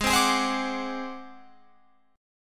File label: A flat 7th Flat 5th A flat Guitar Open F, chord; strummed